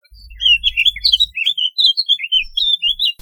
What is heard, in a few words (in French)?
fauvette